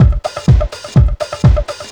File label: dull thump, Deep House 125bpm